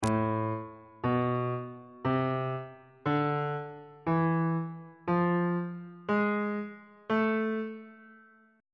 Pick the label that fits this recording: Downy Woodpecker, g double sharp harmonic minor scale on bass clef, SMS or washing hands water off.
g double sharp harmonic minor scale on bass clef